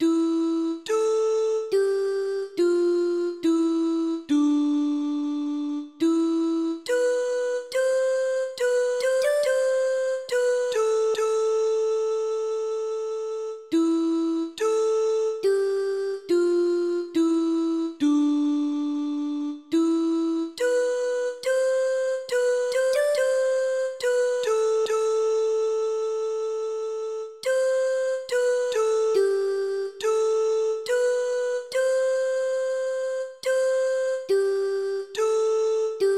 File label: soprano; Bach choral